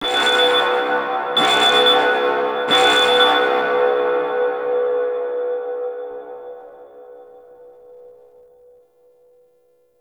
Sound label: Alarm; Warframe; Tau; Cinematics; MercyKillingPartTwo